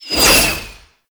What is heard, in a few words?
quick strike projectile pass by; Roathe; Warframe; Tau; Abilities